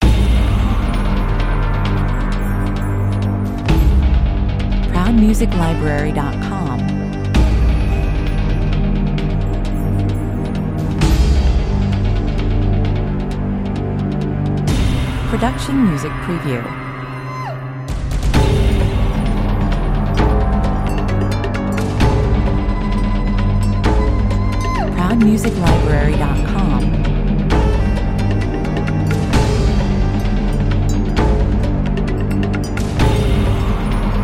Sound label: thai big drum 131 bpm